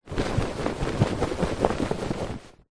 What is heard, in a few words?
foley clothing suit trousers pants shake